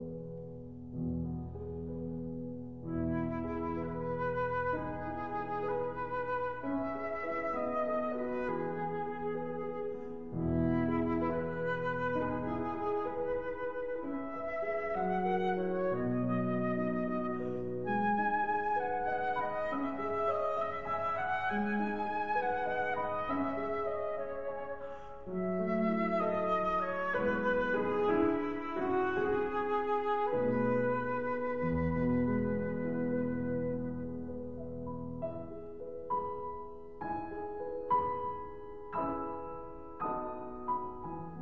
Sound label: Berceuse (Lullaby) for flute and piano